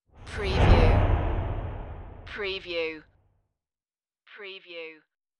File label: Rush